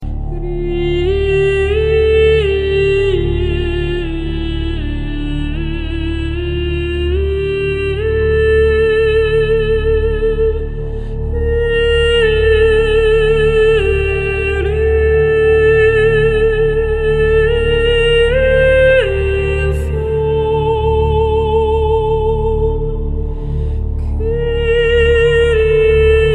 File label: background music